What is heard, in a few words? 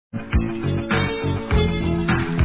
exclaim